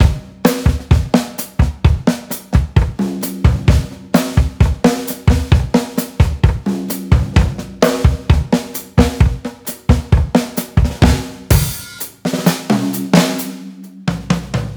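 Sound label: Slow, latino; латино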